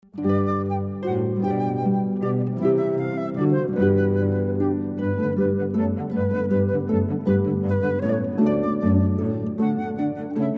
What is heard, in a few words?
Allegro, Lolliet, harp flute cello